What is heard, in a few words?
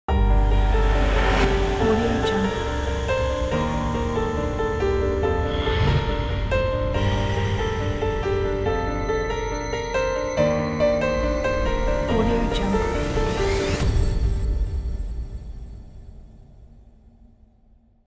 cinematic opening logo; kipaloops